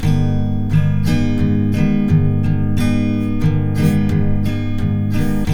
MIN A, Guitars; Spectrasonics; Hans Zimmer Guitars VOL-1

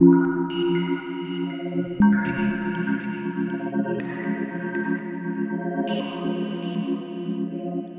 Synth loop (120BPM); musicradar

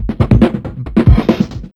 Big Beat Drumloops; RUN 140; USB Soundscan vol.25; AKAI

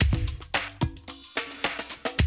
110, Classic Drum Loops

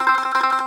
BAGLAMA T0YR; E-MU Producer Series Vol. 7 – Old World Instruments

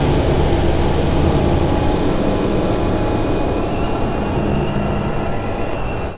beast machine, Drum N Bass; Sonic Foundry (Sony Creative Software); Knutrix Drum & Bass

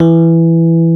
E 0, Electric Pianos; Wizoo Electric Pianos

